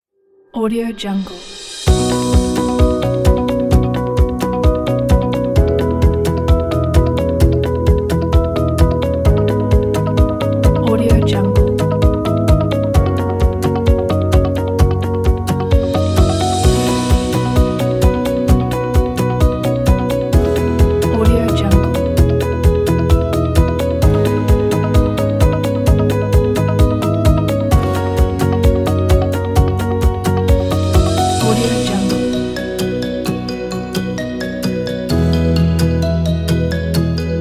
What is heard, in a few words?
corporate inspiring music